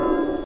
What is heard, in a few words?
GLUNK